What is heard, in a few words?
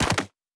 Build Interface; Survival Game Sounds - Lite Edition